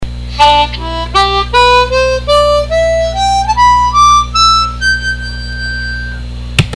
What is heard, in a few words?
blues up